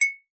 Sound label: household cutlery teaspoon tap side mug coffee